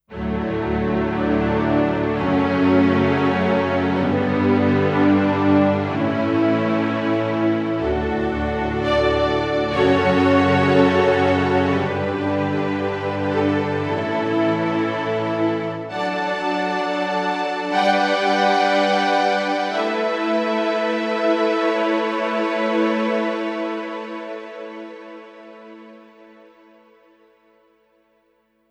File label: Kawai ES120 Strings